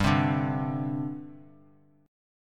G Suspended 4th; Gsus4 strummed; JGuitar

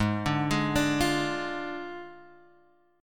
F Minor 6th G sharp, guitar chord

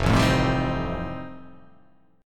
F 9th F, chord

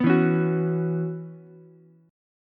Db Minor 11th Db Ukulele C6; Dbm11 strummed; JGuitar

